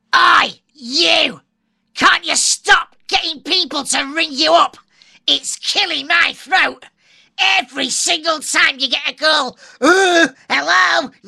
sore throat calling, Funnytones; Arphiola ringtones

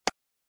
keytone click; Pantech Pocket

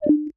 Braille Display Disconnected, voice over sounds; Apple; iPhone-3GS

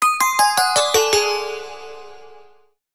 E-mail, phonetones; Sony Ericsson; PlusFourSix; Lars Edvall; Early E-mail

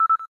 cam timer last; Lancet VS820

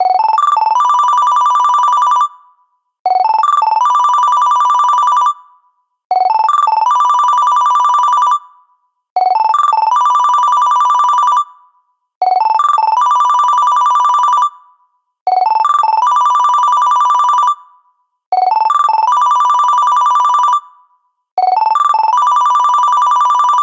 Melody Beep; LG E739 myTouch; ringtones

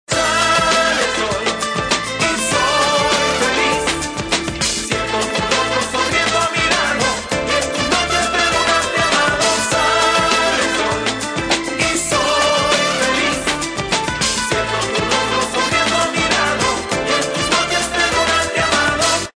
latin tropical; Motorola V600i; Asia

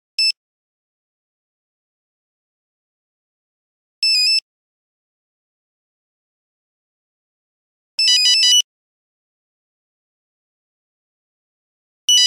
Nokia Buzzer; Windows Phone apps

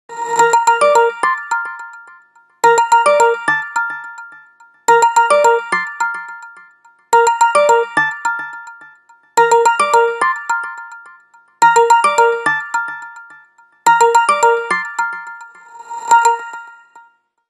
Ring; T-mobile; HTC T-Mobile Shadow

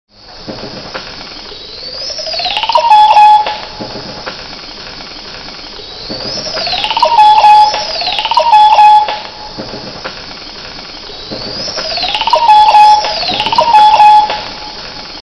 Oropendolas; Retro Ringtones

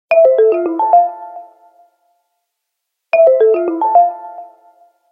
Ring

